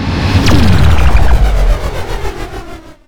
barrier Shut Down; blobAndConquer; combat

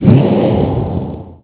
explode; glaxium

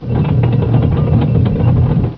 tracked; lgeneral

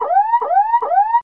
attack; netpanzer

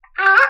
birdlike, aliens; ufoai

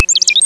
elec Death; blobAndConquer; combat

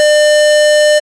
bttn scroll down; gui; planeshift

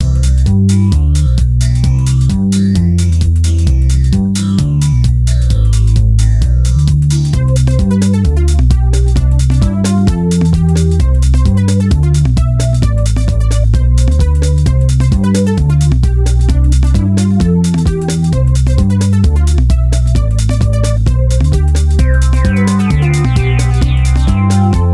Background; amoebax-0.2.0; Angus

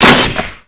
glass hit; blobAndConquer; combat

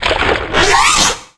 Gasp; scourge; arachnatron

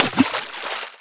splash; AssaultCube

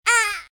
uuh; wormux

